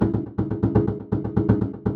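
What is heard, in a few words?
122 BPM Sequence B major G minor Tonic C; innovative sequences 1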